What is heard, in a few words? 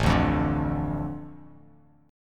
A Suspended 4th Sharp 5th, Bass Standard, Asus4#5 chord; Asus4#5 strummed; JGuitar